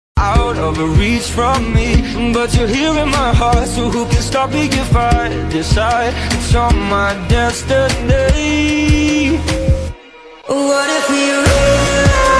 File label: slow reverb ringtone, english ringtones